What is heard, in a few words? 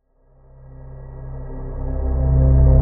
Riser C; Haze; Ghosthack